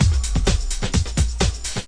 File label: Pump; Macworld (1998-10); Serious Demos; Morpha; 3DO